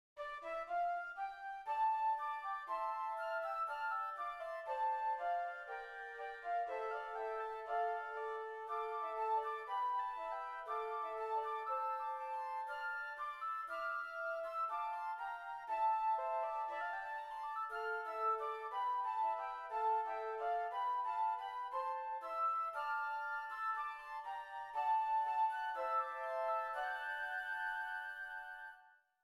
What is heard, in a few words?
Flute; sanctus s129